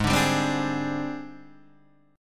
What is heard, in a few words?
G sharp Major 7th Flat 5th Guitar Open D